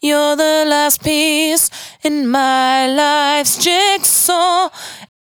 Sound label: 92bpm Bb, Velvet Vocal; Zero-G